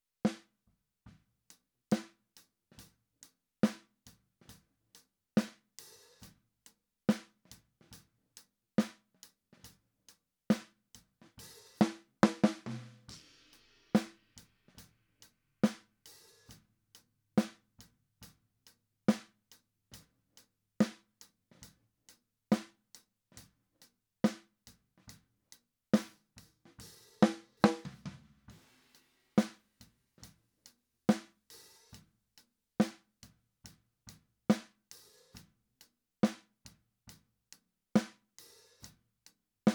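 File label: Snare Top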